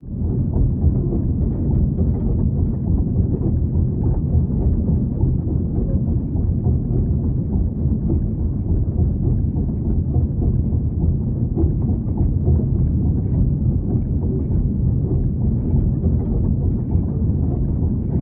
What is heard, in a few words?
ship engines; World Of Warships